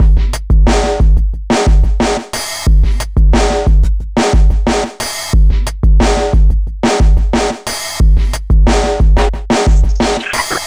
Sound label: mix grunge 090bpm; Zero-G; Downbeat Dance Grooves